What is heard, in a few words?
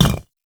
Footstep; Warframe; Qorvex